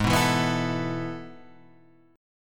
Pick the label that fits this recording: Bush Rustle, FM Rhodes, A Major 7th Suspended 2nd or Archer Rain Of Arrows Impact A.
A Major 7th Suspended 2nd